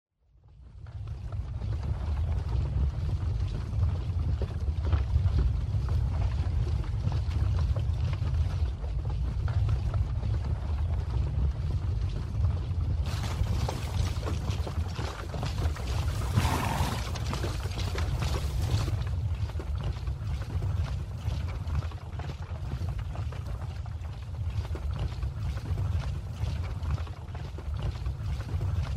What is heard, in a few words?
Marching; Age of Empires III; cinematics